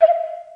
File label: PIPE, Roland TD 7; drum machines